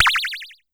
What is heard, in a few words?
Pollard Syndrum